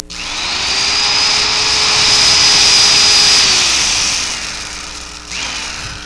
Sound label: DRILL; HAUSHALT